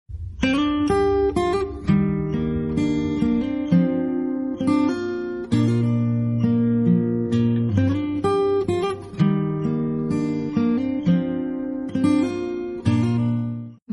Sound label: Guitar Ringtone; Tiktok